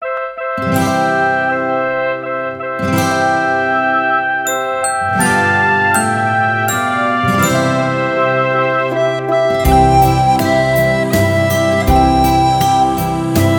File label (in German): Guten Abend gut Nacht Listening, recorder